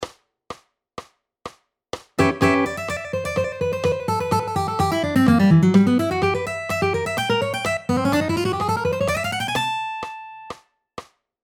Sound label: Manouche Guitar Solo